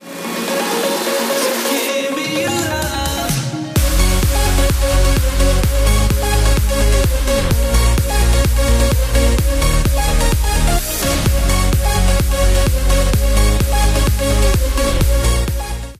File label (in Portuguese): Musica Eletronica; Curso Masterizacao para Musica Eletronica